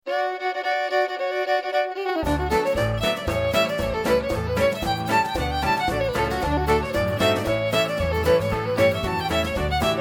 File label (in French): Polka; Halifax